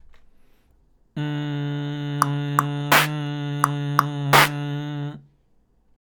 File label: HBB clap shita n hard